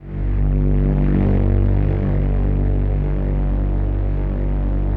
DEEP PAD G1 L, Yamaha VP-1; DEEP-PAD 2; Music Store

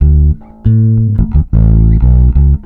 COUNTRY; Best Service Advanced Media Trax Modern Composer

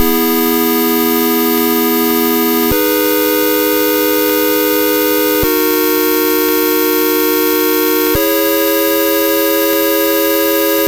Apple II Synth Chord, chiptune; Sonic Foundry (Sony Creative Software)

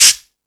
Percussion, Drum Machines

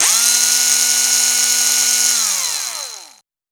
atmo, FX; Ueberschall; Techno Trance Essentials

